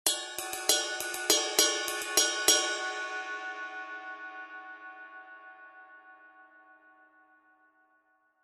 22in Medium Ride, cymbal sound

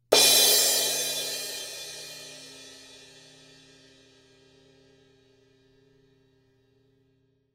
S Medium Thin Crash Crash, cymbal

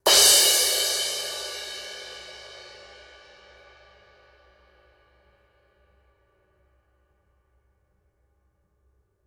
19in A Medium Thin Crash REV, cymbal sound

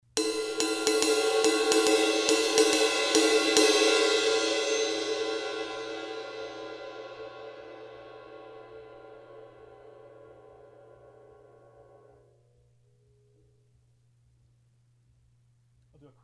A Avedis Ride Pattern, cymbal sound

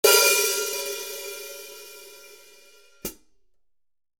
14in Mastersound HiHats, cymbal sound

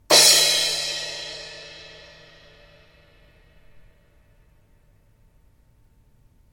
16in Constantinople Crash

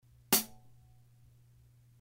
A Avedis Closed Hit, cymbal sound

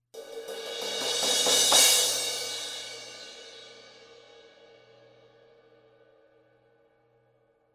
A Avedis Build Crash, cymbal

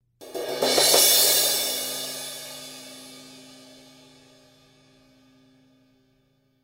S Thin Crash Build Crash, cymbal

